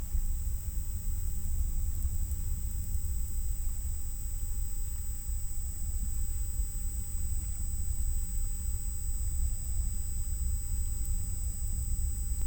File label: Hydrophone